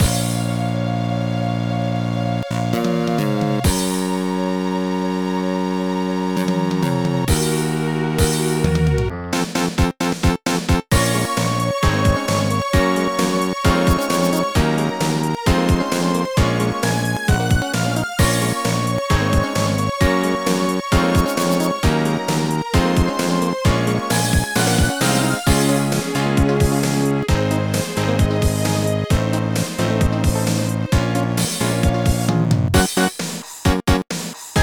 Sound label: Pop Stage (Karaoke); pinky street kira kira music night pop town gamerip